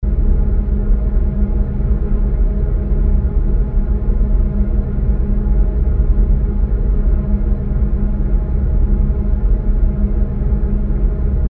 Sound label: deep noisy diffused atmo